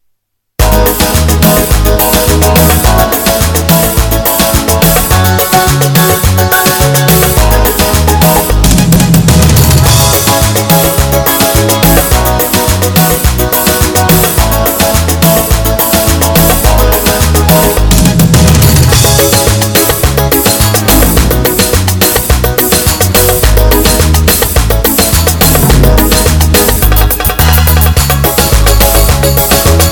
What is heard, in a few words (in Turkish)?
modern 2 4, roland gw 8 ritimleri